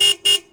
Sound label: horn